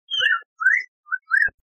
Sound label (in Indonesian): poksai genting